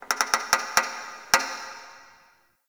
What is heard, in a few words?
Metal Creak Small, Ambience; Warframe; The New War; Zariman